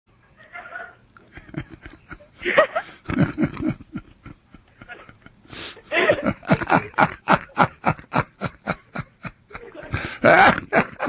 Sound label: laughter